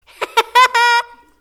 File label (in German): lachen